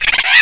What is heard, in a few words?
WASP ATK